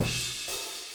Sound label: HiHat